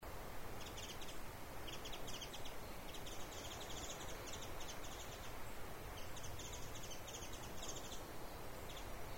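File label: mejiro call, Japanese white-eye; jinaki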